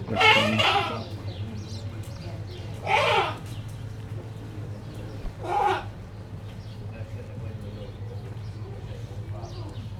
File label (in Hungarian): érdekes hangot ad ki, házikecske; debreceni zoo 2012